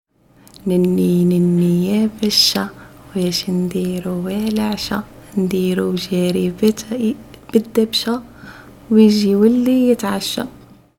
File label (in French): Chant; ABBAYE